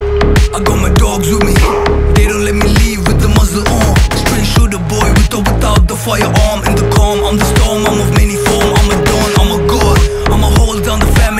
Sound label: Song, ringtone; Marco